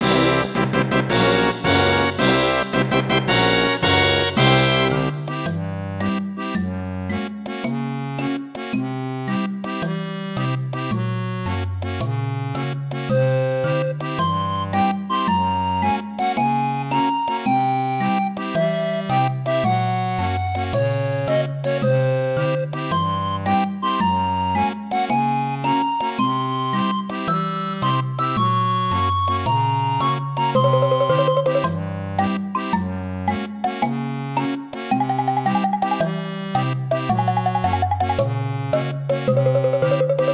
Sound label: Secret Fanfare; Banjo-Kazooie